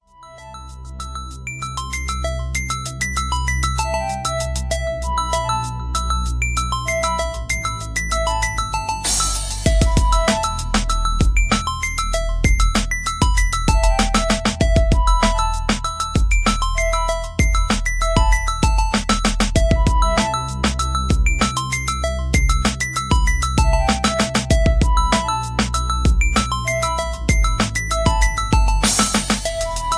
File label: Beat